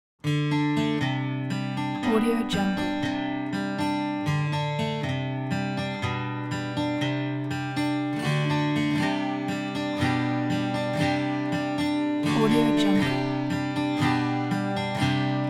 acoustic guitar rock

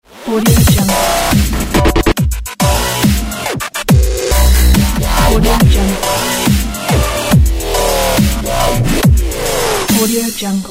dubstep glitch logo; archimusic

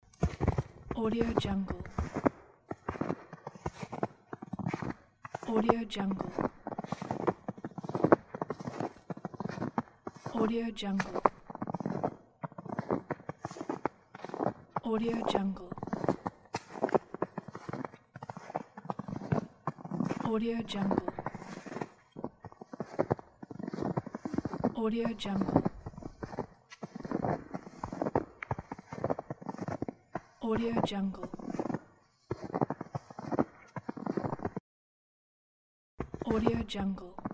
snow walk

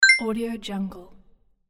coin pickup arcade videogame

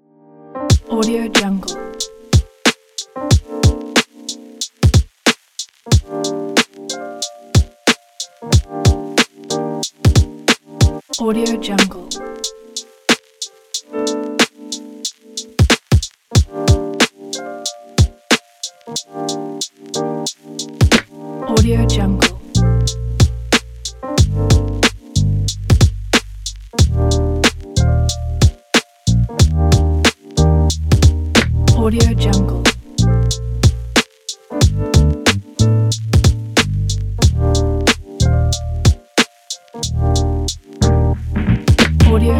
lo fi chill hip hop pack

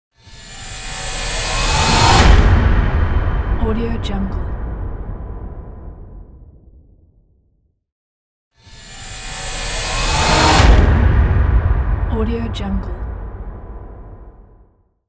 rising tension; phillipmariani

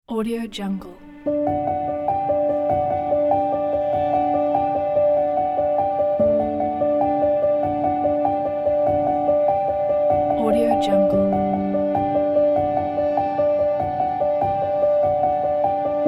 beautiful cinematic piano movement

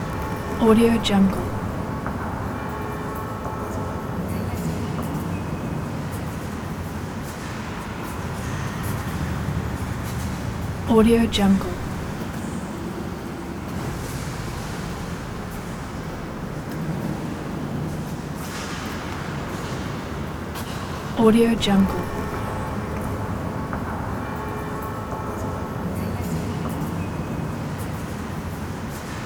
calm city ambience loop

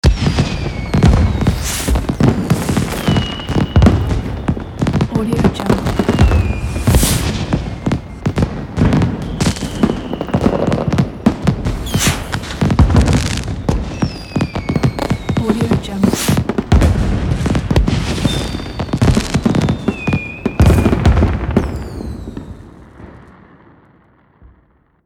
a fireworks

